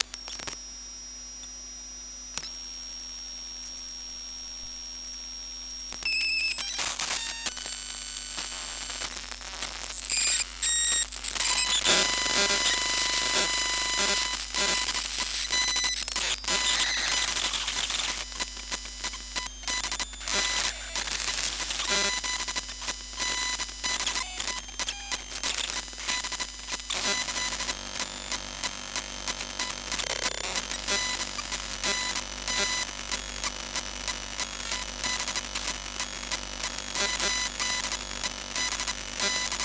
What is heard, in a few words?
Osprey Modem booting, connecting to network, shutdown; EM Pickup